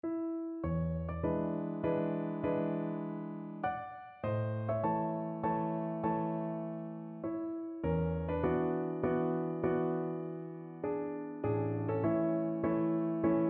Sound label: chopin prelude a, piano duet